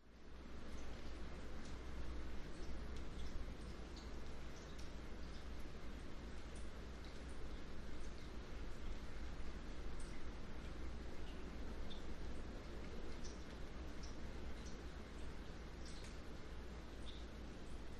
nature rain approaching thunderstorm light rain roof drips wind palm trees; distant thunder; zapsplat